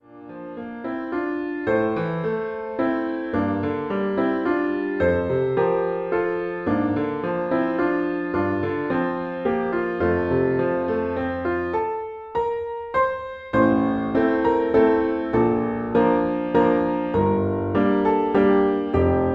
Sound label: intermediate piano solo